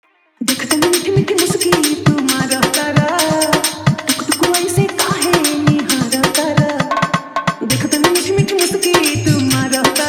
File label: Bhojpuri Hard Toing Bass Mix